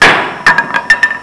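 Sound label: WOOD DROP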